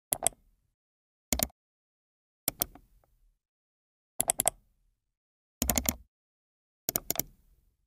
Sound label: mouse clicks